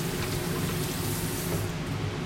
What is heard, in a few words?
washing hands